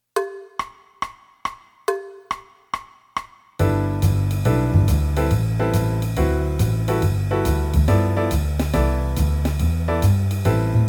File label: Swingle Bells, qn=140; Christmas